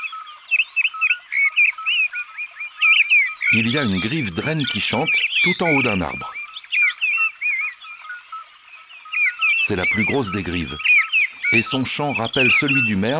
Grive draine; abc de la nature

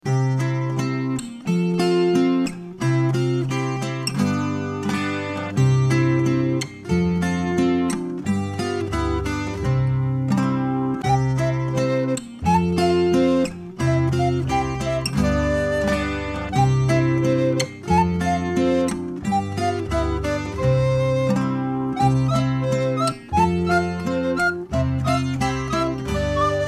instrumentale

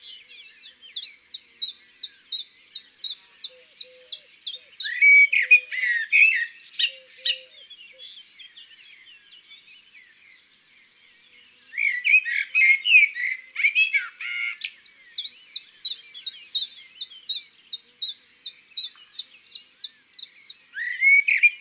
Pouillot veloce, Chants d'oiseaux dans la campagne

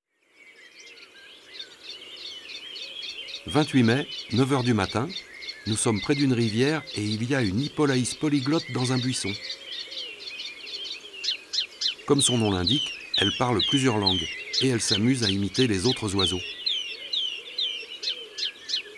Hypolais polyglotte ABC des chants d'oiseaux

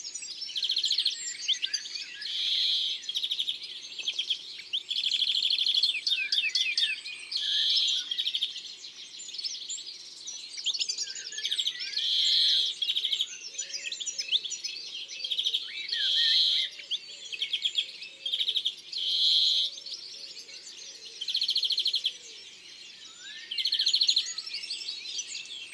Verdier d'Europe, ABC des chants d'oiseaux

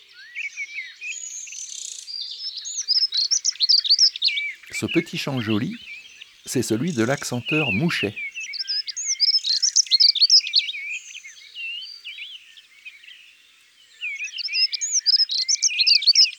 Accenteur mouchet, ABC des chants d'oiseaux

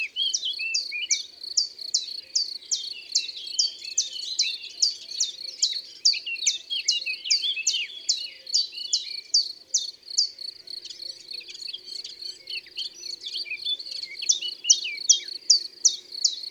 Pouillot veloce; ABC de la nature

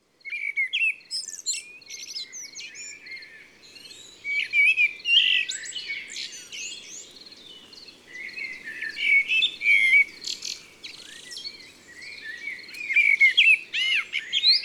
Merle noir et fauvette a tete noire Concerts Naturels de la Drome; Studio les Trois Becs

